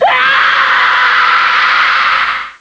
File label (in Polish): krzyk